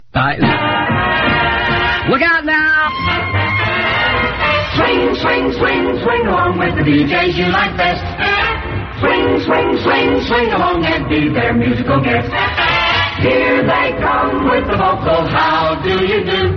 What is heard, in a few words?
jingle; kioa; pacemaker